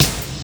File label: eighties trebly reggae acoustic snare c sharp key